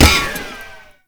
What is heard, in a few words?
Tank Shield Impact; Enemies; Gameplay; Lasrian Tank; Warframe